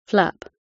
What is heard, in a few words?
flap